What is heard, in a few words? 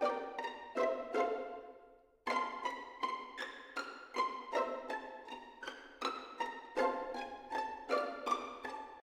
gift intro loop; santa vs rudolf